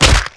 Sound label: demon attack; warlock